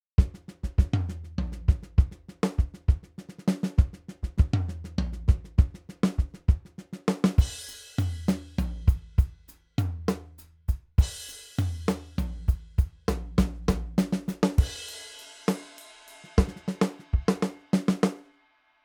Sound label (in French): batterie sans